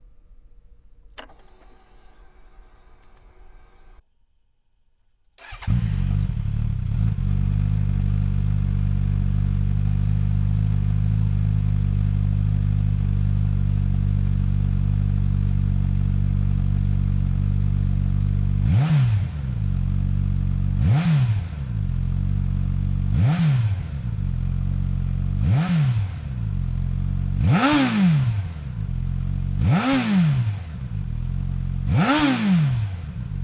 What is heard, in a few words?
engine sound, gsx-s1000